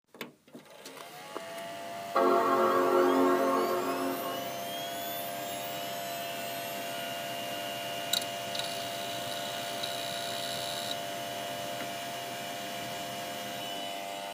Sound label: wd screamer